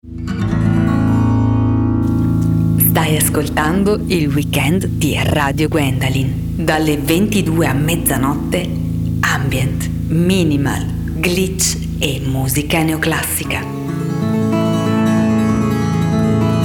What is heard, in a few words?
Jingle; Weekend Sera